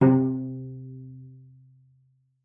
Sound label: C3, CELLO PIZZ; Xsample VOL-5